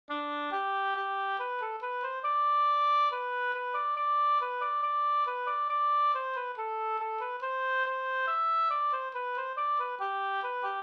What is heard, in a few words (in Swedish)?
skanklat